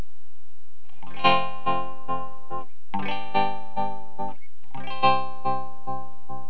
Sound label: Trem; TremBear